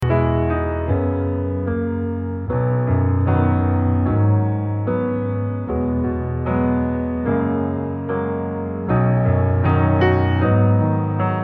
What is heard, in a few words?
piano solo